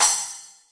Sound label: SPLASH, Roland TD 7; DRUM MACHINES